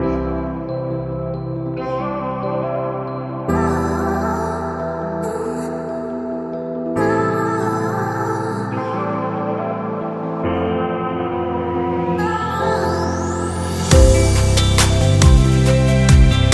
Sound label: Emotional